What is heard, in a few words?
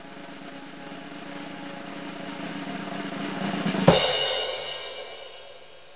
Roll and Crash